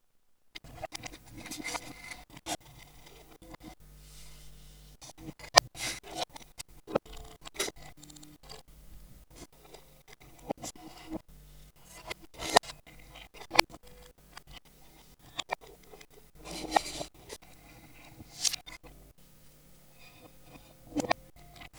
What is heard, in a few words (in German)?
Samples Nebengeraeusche; komposition fuer spieluhr; algorhythmisch